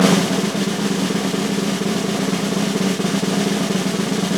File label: LUDWIG, DRUMS; Best Service; Alpine Volksmusik